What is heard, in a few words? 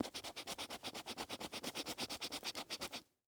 Household Pencil Erase On Paper Fast